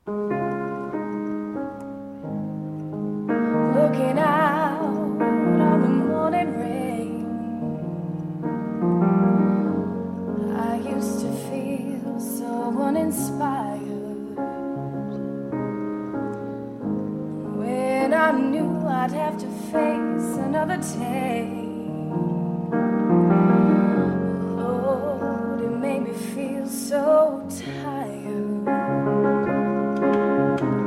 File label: Piano And Voice